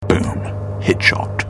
boom headshot sniper

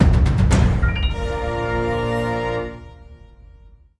orchestral

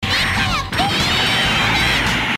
laser eye beam

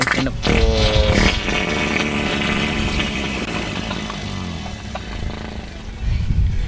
wet fart

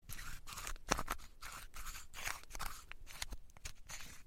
cannabis sound effects, grinder

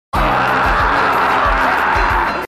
screams

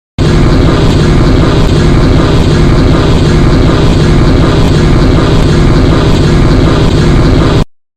bath toilet; giant

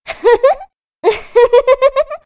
intro laugh; larxene

